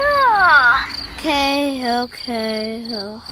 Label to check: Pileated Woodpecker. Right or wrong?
Wrong